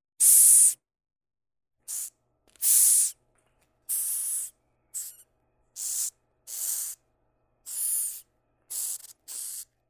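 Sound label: Madagascar cockroach hisses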